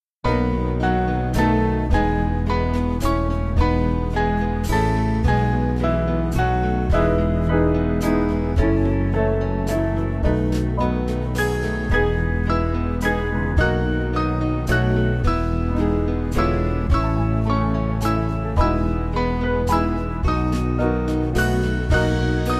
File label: Band; Blest Be The Tie